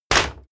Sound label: wood pallet drop